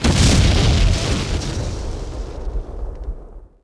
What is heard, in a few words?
explo med hissy; impacts explos